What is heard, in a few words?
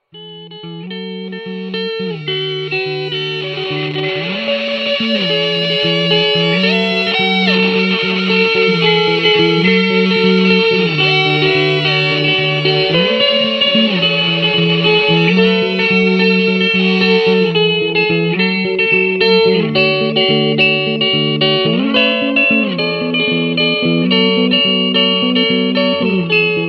only guitar